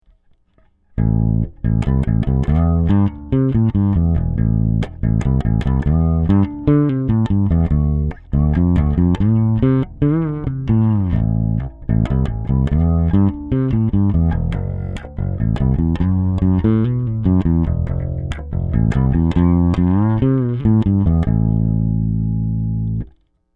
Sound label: four string fretless semi hollow